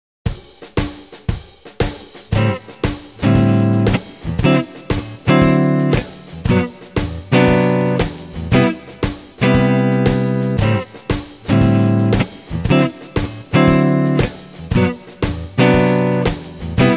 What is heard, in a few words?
Rhythm Guitar